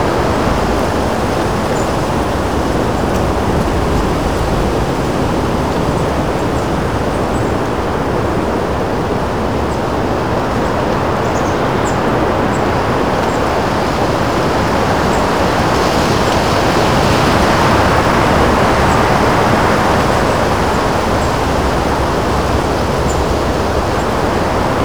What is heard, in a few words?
Amb Near Beach; Bruce Lee Quest Of The Dragon; XBOX